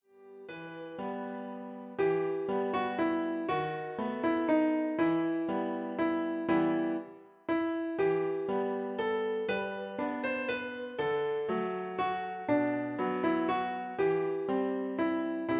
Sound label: What child is this Kl